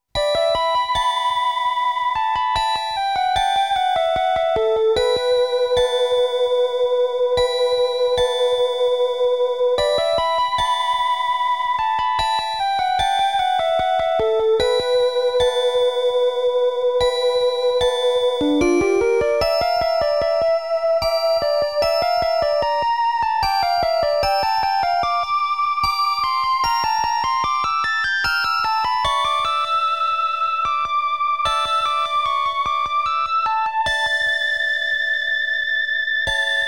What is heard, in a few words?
Spirit Hymn; Dinosaur; Forest